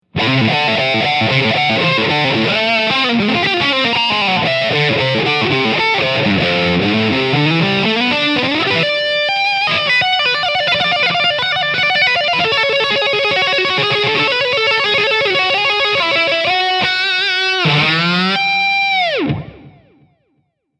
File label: Boogie